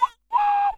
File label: WildFX, African; Quazibeat; African Secret; SFX